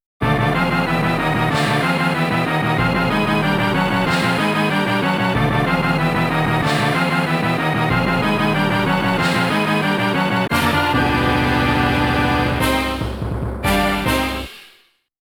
Continue GAME OVER; Capcom G.S.M. 7; Tenchi wo Kurau II The Battle of Red Cliff

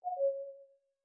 Knock Notification; Do Not Feed the Monkeys

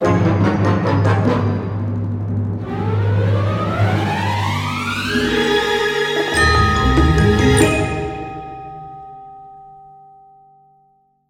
Comic Effect Stinger

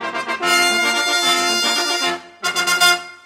level won; Arcane Quest; android game

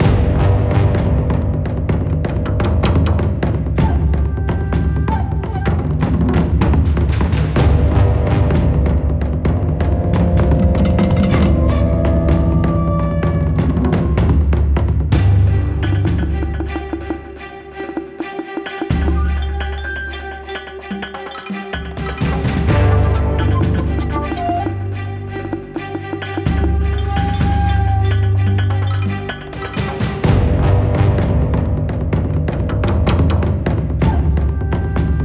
BGM stage battle

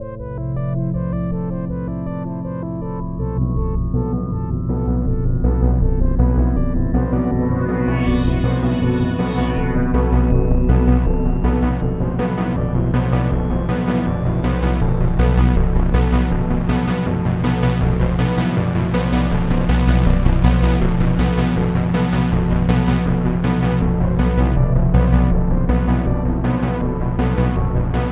tension rising; the warriors 2005 ps2

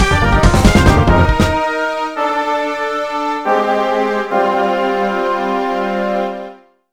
BGM Battle Lose; Sabaku; Irodzuki Tingle no Koi no Balloon Trip; NDS

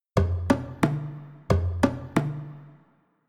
Drums

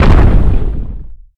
rock impact heavy slam

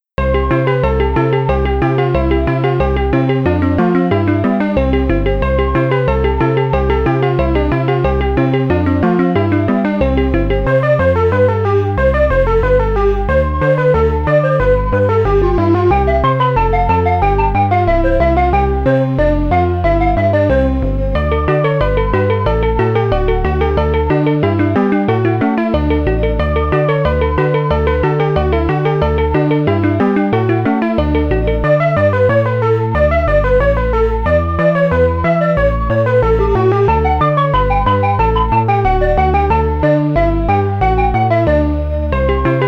English Country Garden; Oh No! More Lemmings; Archimedes

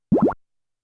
Coin; Donkey Kong; arcade

